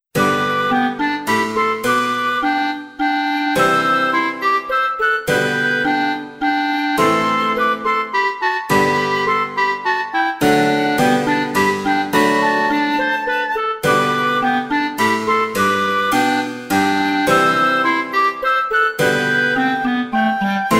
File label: Minuet in G Major